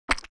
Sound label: jelly squelch